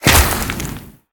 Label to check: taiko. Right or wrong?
Wrong